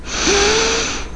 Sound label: sniff; Greatest Stories; fairy